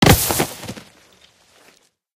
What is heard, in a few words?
brush fall; Golden Crayon Sounds